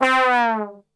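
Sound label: C 2 trombone; Best Service; Brass Super Section; 90 s Sample CDs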